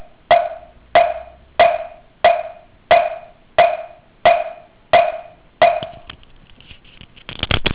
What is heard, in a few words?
mokugyo